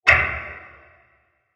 Bursting Plastic Impact With Large Reverb; Bursts; Crafted Signals

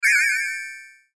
Magical Warble, Magical Warble High; Cartoon

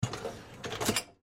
Dishwasher Out, Dishwasher Pull Out Rack; Whirlpool Appliance; Household; Appliances; Blastwave

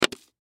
Credit Card Drop On Counter Top; Credit Card Drop Slide On Counter Top; Drops (Sound Effects:Objects); Blastwave; Foley

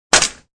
Can Crush, Crushing an aluminum can.; Crunches; Objects

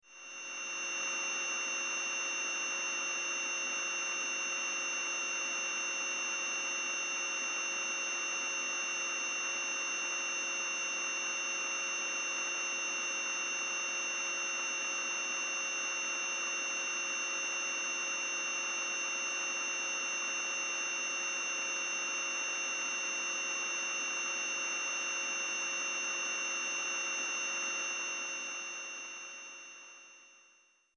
Science Fiction Computer Screen High Pitch Static

